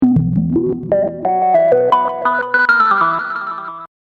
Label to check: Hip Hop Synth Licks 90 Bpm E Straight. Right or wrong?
Right